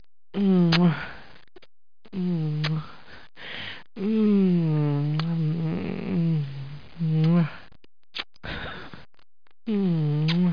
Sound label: kiss